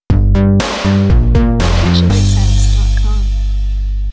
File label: Synthwave